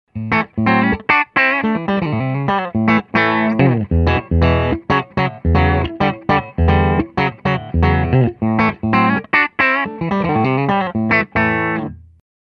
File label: Hollow classic bridge, pickups; Hollow Classic VA2; Snapin, Bridge pickups.